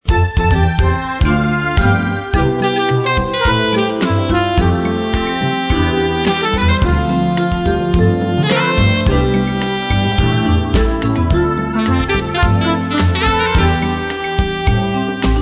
easy listening

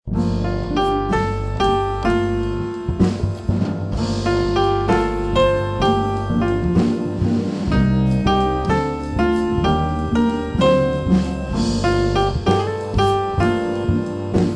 light jazz

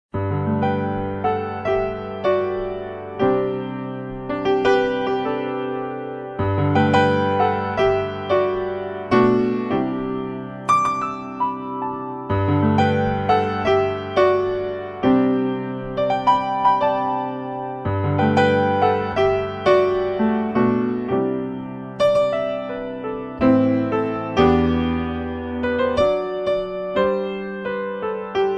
classical music

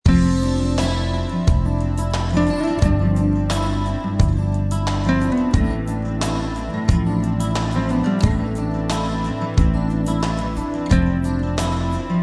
light rock

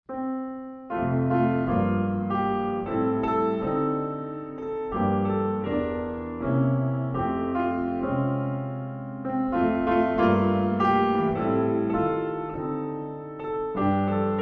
holiday, Oh Tannenbaum

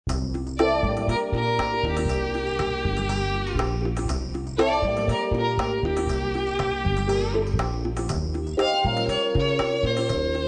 light jazz

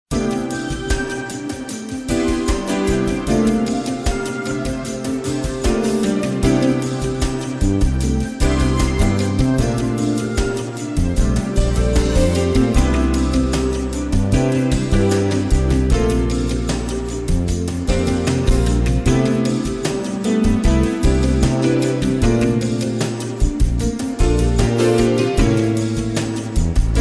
contemporary music

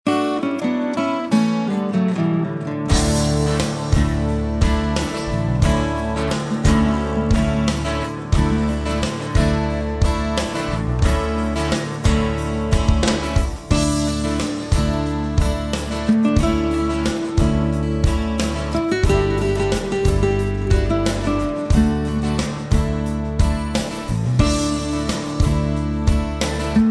light rock

